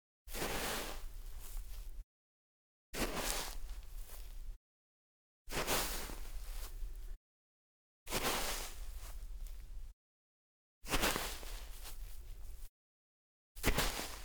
Snow, Foley Footsteps; Sound Ideas

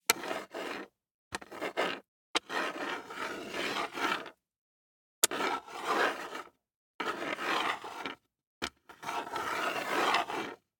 DICE on Hard Wood, Slide, Standard, 2 Two Dice; Samples; ArticulatedSounds; DICE Sfx Party (Extended)

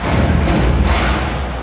Football Crowd Stomp Loop

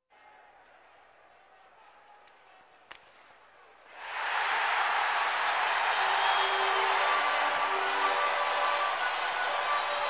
Sports crowds

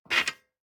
DICE on Metal, Grab, Standard, Five Dice; Samples; ArticulatedSounds; DICE Sfx Party (Extended)

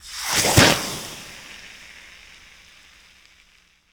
Steam Sizzle Hit

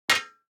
DICE on Metal, Drop, Standard, 2 Two Dice; Samples; ArticulatedSounds; DICE Sfx Party (Extended)

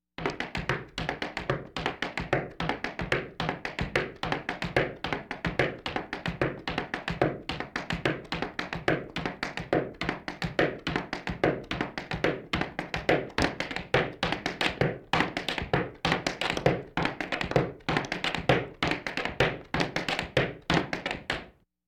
Laugh tracks

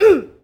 Boxing Female Punch Grunt

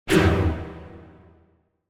Symphonic Hit Mixed Up - Strings; Hollywood Edge; sound designer's toolkit v2

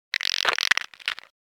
Walkie Talkie Static End Of The Connection Noise; Hollywood Edge; sound designer's toolkit v2

